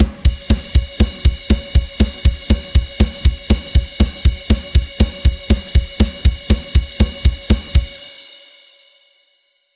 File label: a soft rhythmic drum roll, crescendo